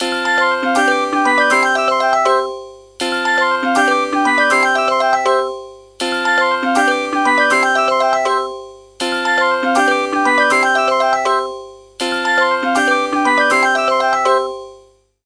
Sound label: Waltzer